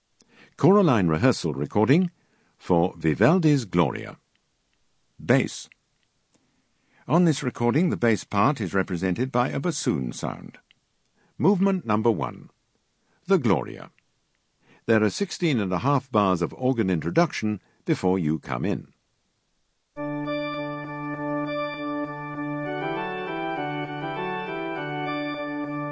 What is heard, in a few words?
Bass; Vivaldi Gloria